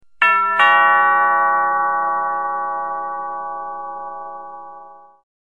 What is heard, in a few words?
ringtone doorbell